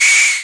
fire; invinco

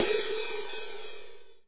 flanged ohh, hats; DRUMS (SINGLE HITS)

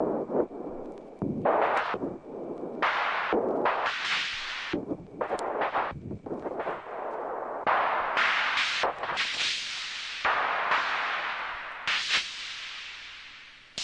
stereo airscratchers; Atmospherics

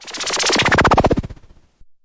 noise MiniBrute, Noise Sweeps

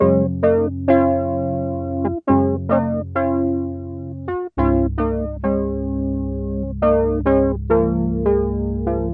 chords; REMIXABLE COLLECTIONS

